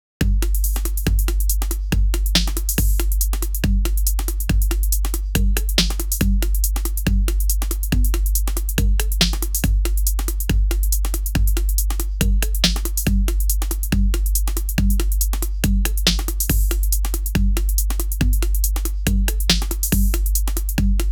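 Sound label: create a tropical, danceable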